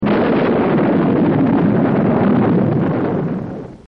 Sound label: Sonic Boom